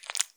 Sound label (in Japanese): 深めにストローク, ピストン運動 激しめ